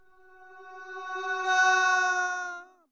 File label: long Fall; falling